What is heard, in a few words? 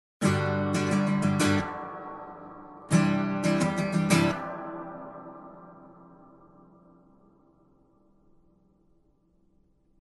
With Reverb; Acero